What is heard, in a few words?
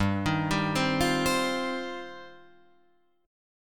G 7th Suspended 4th Sharp 5th, Guitar Drop D